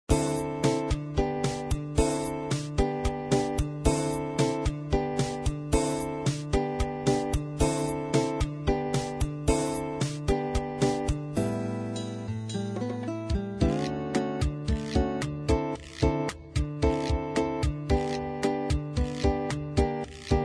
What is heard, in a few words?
19 tone samba; 12-tET